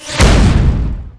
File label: rail; starsiege